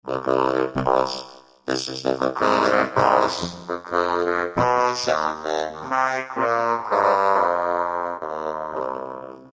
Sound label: Vocoder Pulse, Korg microKORG; Factory Voices